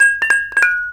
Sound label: KONGWONG